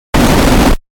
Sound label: fast attack; Fast Attack Hiss Static Noise; Production Element Imaging Element Accent Transition